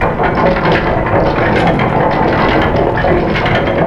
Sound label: room spin